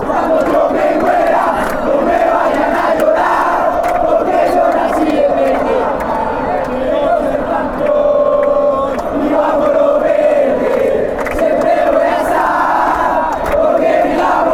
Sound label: chant; when i die